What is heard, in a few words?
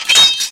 Soldiers Fighting